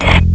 sword hit bod; sword of sadism

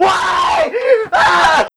rage; w00tguy; arcade

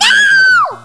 scream

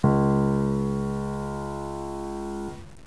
piano cis

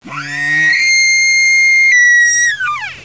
pit drone alert; betapitdrone

